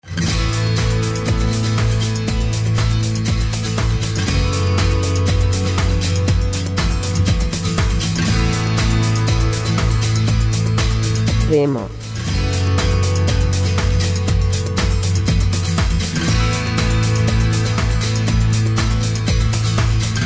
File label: Acoustic